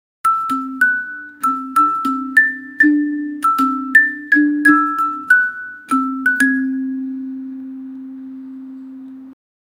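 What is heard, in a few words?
playful marimba background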